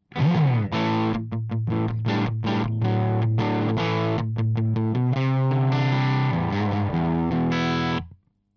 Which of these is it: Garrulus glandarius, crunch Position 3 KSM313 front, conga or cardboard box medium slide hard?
crunch Position 3 KSM313 front